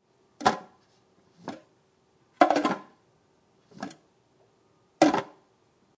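Stack Plastic Tupperware, The sound of plastic tupperware being stacked; Kitchen; Household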